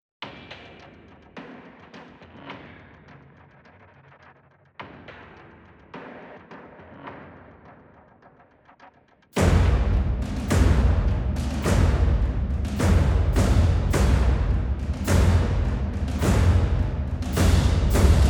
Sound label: Perc Only